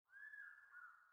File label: sting wild bird; Rust